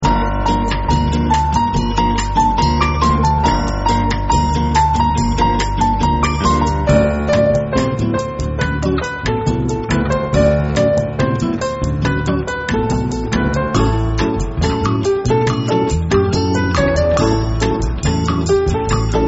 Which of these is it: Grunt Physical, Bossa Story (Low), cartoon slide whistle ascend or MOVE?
Bossa Story (Low)